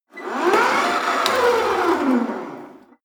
rope trainer pull sequence medium short, fitnessstudio; sport freizeit